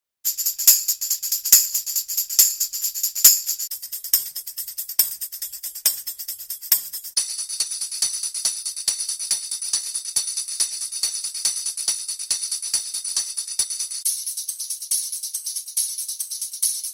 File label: Tambourine